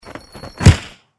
attack; hero

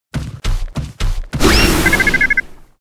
attack; hero

